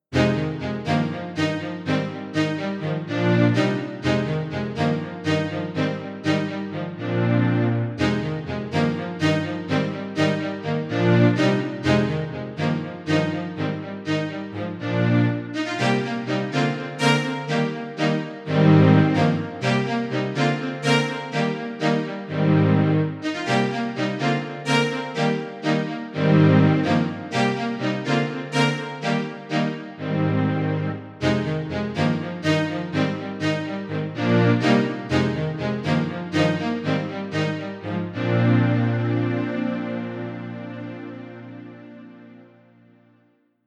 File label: Fast Strings; VIVO S10